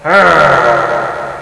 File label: rrrrrr; People; Sound Library 2000; Wayzata Technology